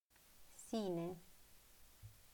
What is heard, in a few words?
sine